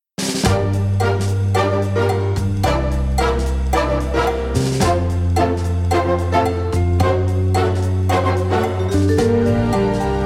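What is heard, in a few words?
instrumental version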